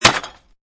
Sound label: Single sword stabbing ground sound, Heavier variation; Swords (Sound Effects:Combat)